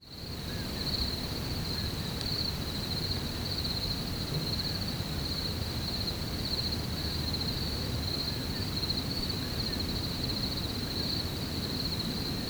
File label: Nightime Chorus; PomoLuxe